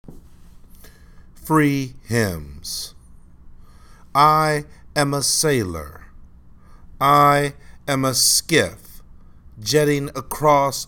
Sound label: Free Hymns